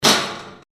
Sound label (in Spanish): Estruendo; Banco de Sonidos - Facultad de Art; Leitao - Cazanave - Capelluti - Suasnabar